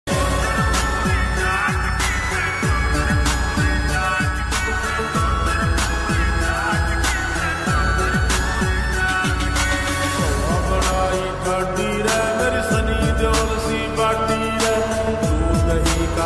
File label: song slowed reverb ringtone